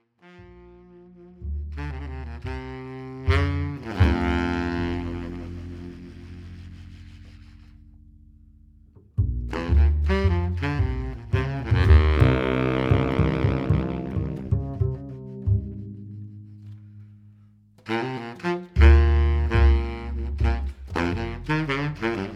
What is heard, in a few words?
jazz sax bass